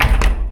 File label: door glass close; everness